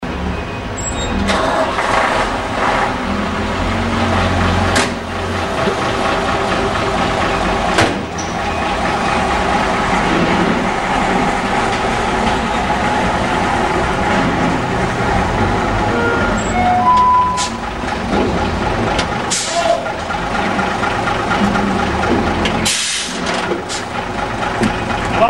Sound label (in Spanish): SONIDO PEGASO 3046 ARRANQUE